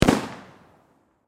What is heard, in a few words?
Firework Explode, Bang; Fireworks (Sound Effects:Backgrounds); BlastWave